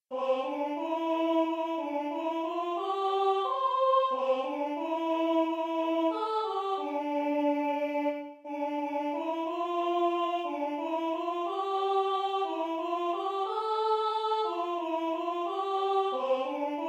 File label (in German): 1. Stimme, Jagdlieder; A bixerl zan Schiassn